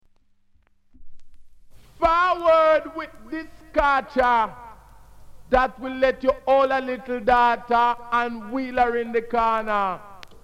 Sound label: jazz